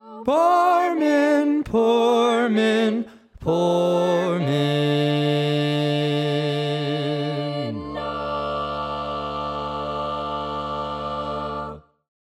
Barbershop Tags

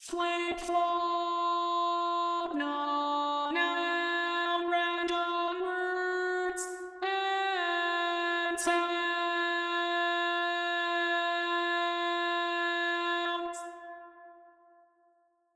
Lead, barbershop tags

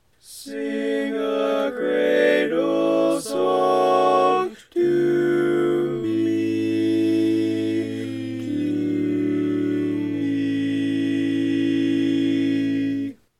Tenor, barbershop tags

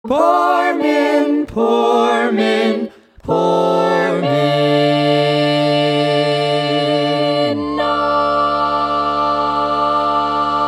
All Parts, barbershop tags

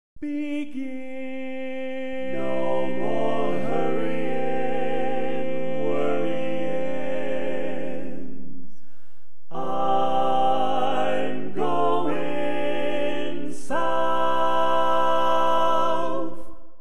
Tenor, barbershop tags

